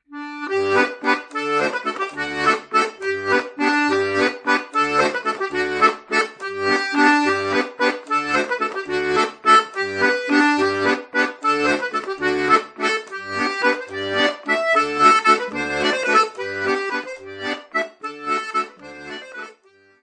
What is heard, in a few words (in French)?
Bourree - France